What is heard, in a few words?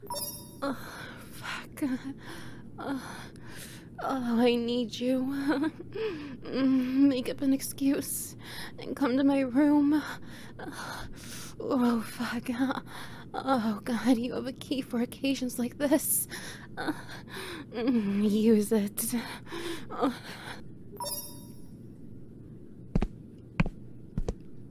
SFX, no wet noises